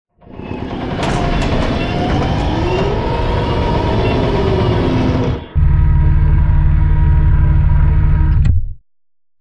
Sideways Town Giant Floor Panel Arrive Rotate Lock Servo; Boomtown; Titanfall 2